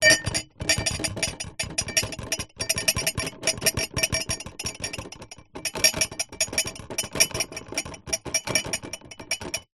household plastic tray tub containing glasses plastic cups shake